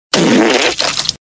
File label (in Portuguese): pum molhado